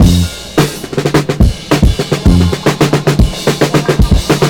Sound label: 73 bpm breakbeat f key